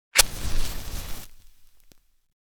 Matches lighting with fire burning striking

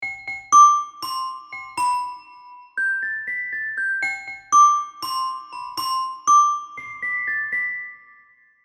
Glockenspiel upbeat melody

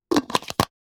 Skin care cream jar close

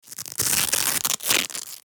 Velcro strap manipulation

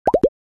Cartoon accent popping

